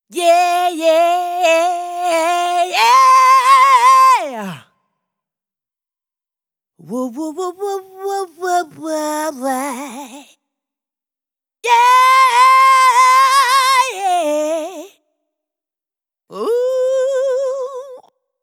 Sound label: Vocals With EQ